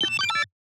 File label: Notification, UI SOUNDS - MUSICAL; Sound Ex Machina; Bridge Operation